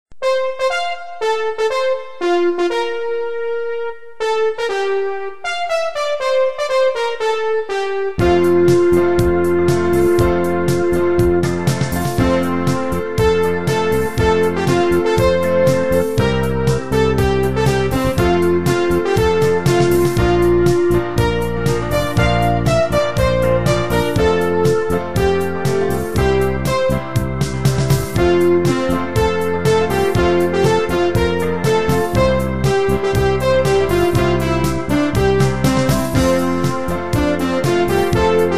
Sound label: Anthem; ChienKuo